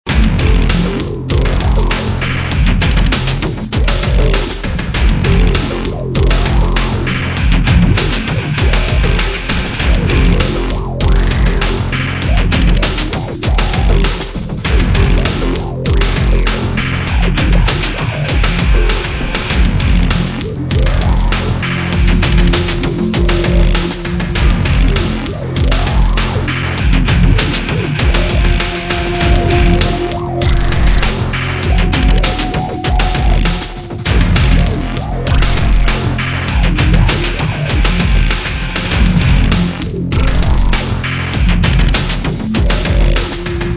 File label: rock